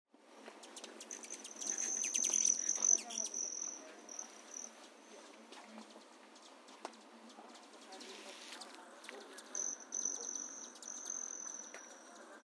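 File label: Broad-tailed Hummingbird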